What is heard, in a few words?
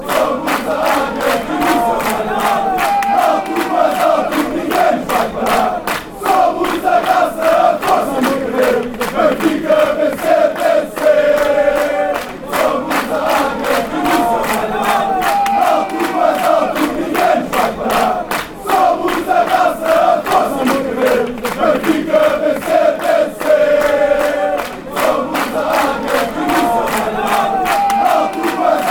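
benfica win win, football songs and soccer chants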